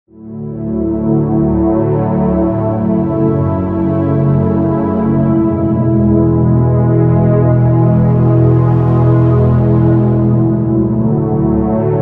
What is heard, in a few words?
Underscore PAD; Worship Flow